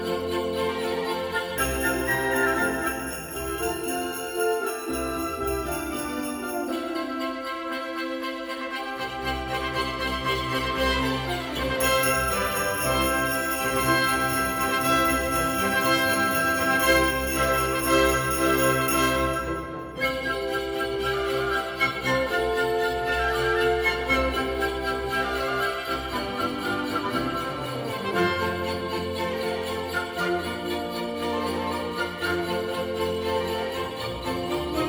Divertimento in F Major Die musikalische Schlittenfahrt The Musical Sleigh ride